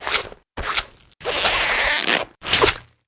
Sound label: fix